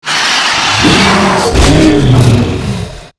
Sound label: Chimera Die; Seer; GamigoNA